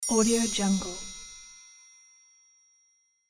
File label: diamond sparkle